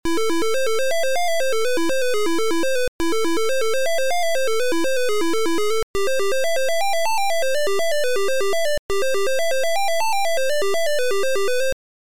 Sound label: MELODY